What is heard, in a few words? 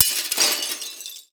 Crash Glass Inside Frame Broke By Hatchet; crashes; AdobeCC